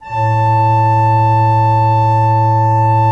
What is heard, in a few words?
SWL A1, Cathedral Organ; ILIO; Propeller Islands